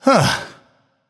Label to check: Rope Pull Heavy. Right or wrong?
Wrong